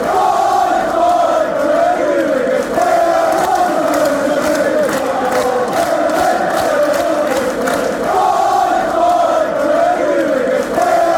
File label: fight fight chant, fanchants